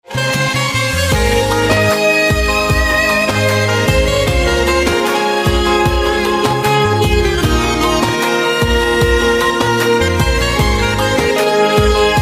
Saxophone Instrumental Ringtone

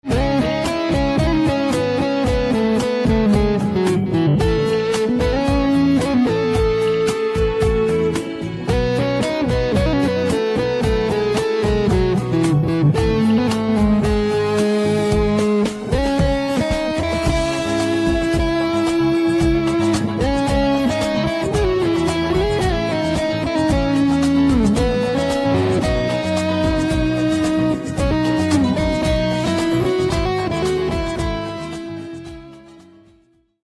Electric Guitar Instrumental Ringtone

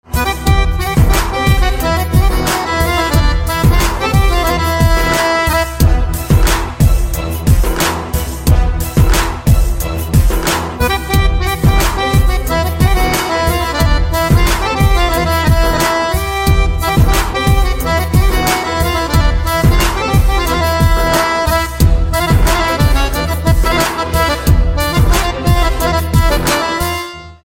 Harmonium Instrumental Ringtone; punjabi instrumental ringtone

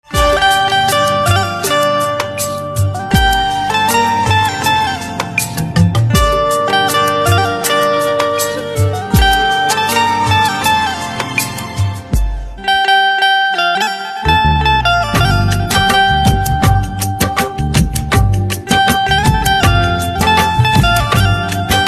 Banjo Instrumental Ringtone

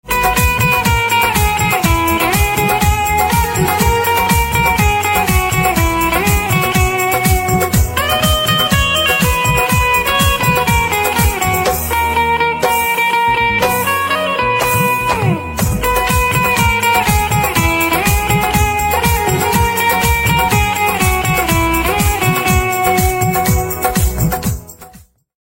Guitar Instrumental Ringtone

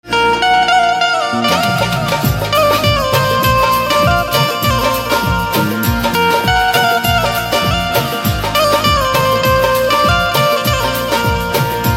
Banjo

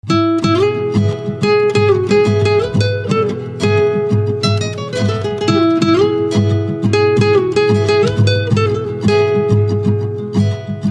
Guitar Instrumental Ringtone

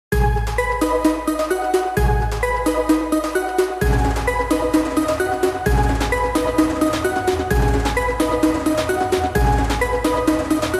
Xylophone Music Ringtone

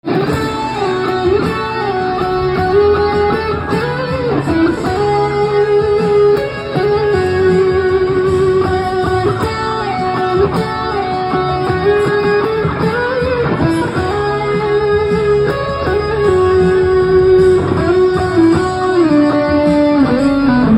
Electric Guitar Instrumental Ringtone; english instrumental ringtone

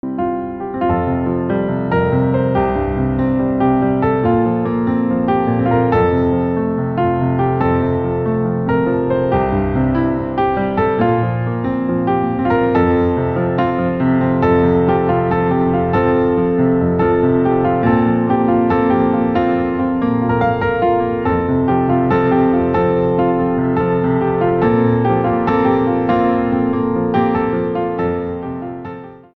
Piano Instrumental Ringtone

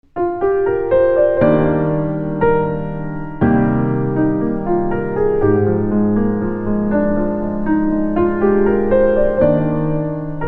Piano Instrumental Ringtone

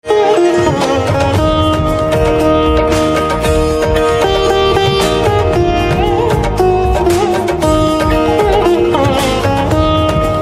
Veena instrumental Ringtone; sitar and veena

